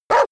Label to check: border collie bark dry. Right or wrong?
Right